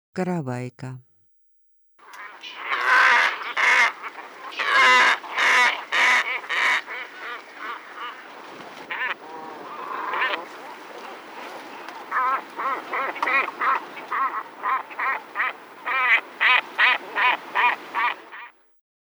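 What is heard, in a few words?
glossy ibis voice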